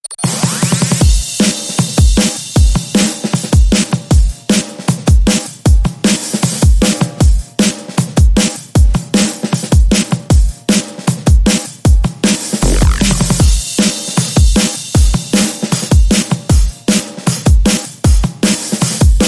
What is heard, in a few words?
Beat